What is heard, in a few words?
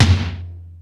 Lazer Drums, drum machine